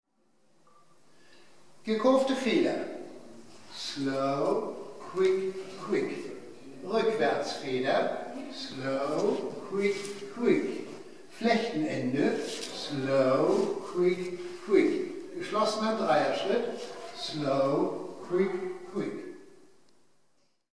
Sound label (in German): Slowfox 4 Grundtakte